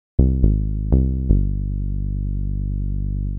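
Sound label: 122 BPM Bass Synth D major C minor Tonic G; Pro Bass Synth Studio Kit; MMB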